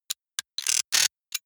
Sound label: digital mechanical click, robot; comedy cartoon